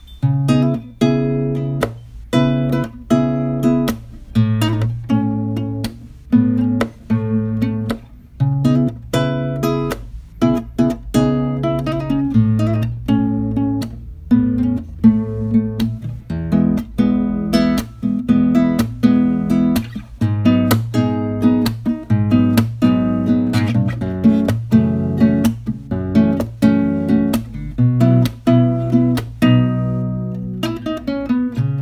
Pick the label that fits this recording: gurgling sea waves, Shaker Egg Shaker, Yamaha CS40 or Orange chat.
Yamaha CS40